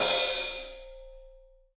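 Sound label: hi-hat, Real Kit; 90 s Sample CDs; Sound & Vision - Gigapack I CD 1; Real-Kit s 4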